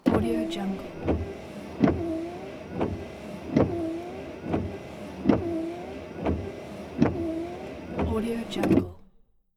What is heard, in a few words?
car wipers interior multiple